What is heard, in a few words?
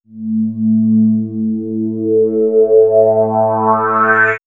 Swirls Ambient Drone, pads; X-Static Goldmine